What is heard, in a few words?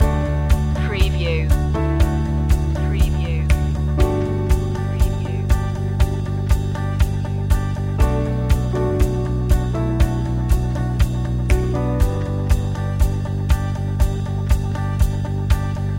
all good people loop